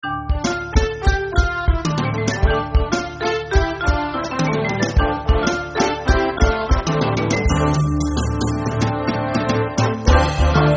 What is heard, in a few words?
Latin flava; Petro-Canada